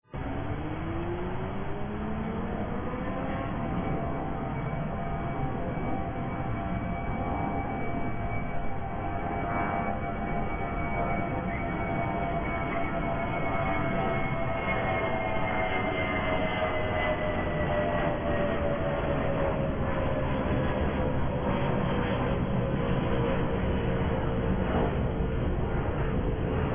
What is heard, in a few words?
Jet Aircraft; aircraft noise